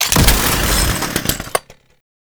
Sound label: Chain Saw Tonfa Stack Buff Drop; Warframe; Grineer